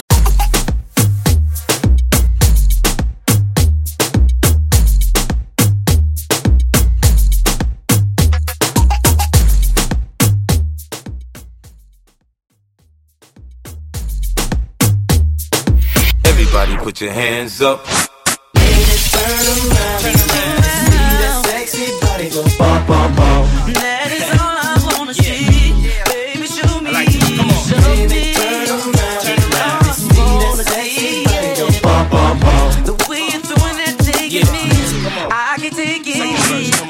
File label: Moombahton Bootleg Mash Up